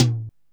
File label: drm F#1, DRUMCOMPUTER, EMU SP 12; Best Service; ProSamples vol.55; Retro Sampler; EMU SP 12+1200